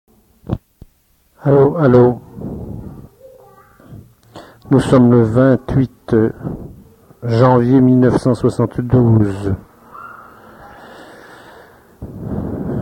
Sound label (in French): Rires d'enfants